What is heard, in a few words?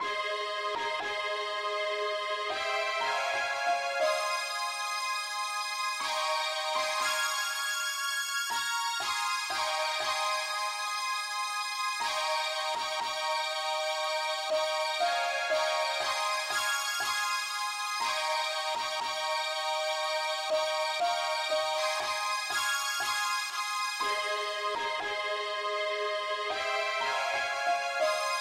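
uke lead key A flat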